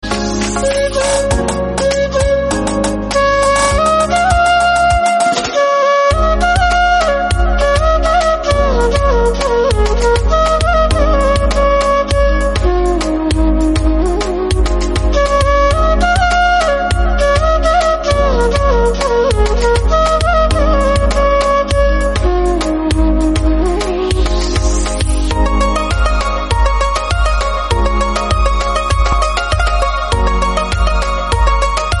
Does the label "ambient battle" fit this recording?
No